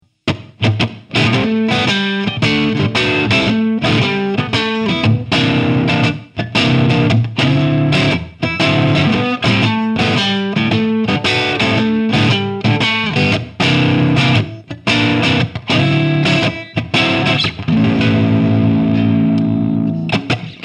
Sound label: Gibson Flying V V2 Crunch